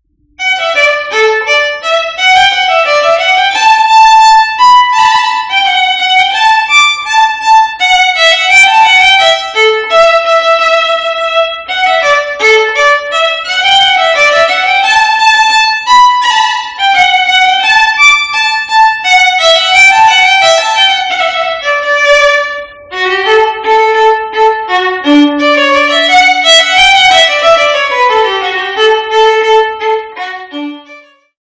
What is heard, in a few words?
solo rondeau; mouret